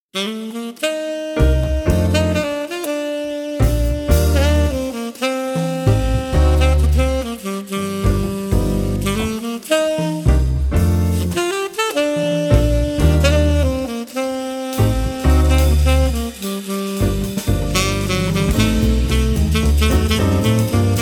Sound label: Pi Ba Dr Sax